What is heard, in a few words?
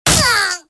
rabbid hit; Super Rabbid Strikes Back; JavaFX